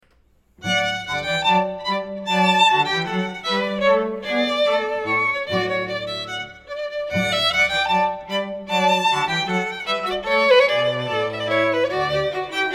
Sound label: Balletto, string quartet; Eloquence String Quartet